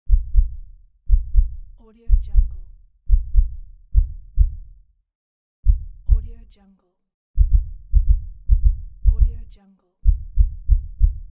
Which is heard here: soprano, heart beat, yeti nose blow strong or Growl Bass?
heart beat